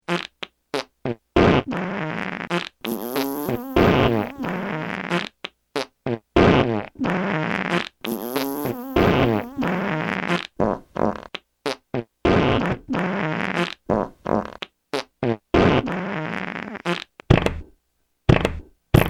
Farts Techno Poop

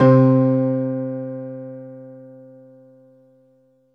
Lyre C3; One Shots; Instrument

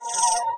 leaving conversation; There; ThereClient

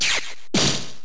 Area Grab; MMBNMMOP

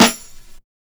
Snare Tam Tam, drums

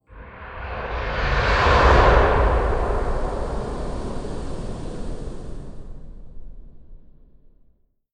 Dive Bomb Whoosh DISTANT; MountainGuardian; Journey

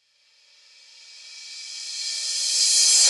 Cymbal Crash Reverse; One Shots; Drums; Gnirob; Ableton Live 12 Lite; Core Library

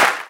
Clap Trap Layer, Drums; One Shots; Ableton Live 12 Lite; Core Library

